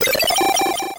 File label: super Egg; Dino Run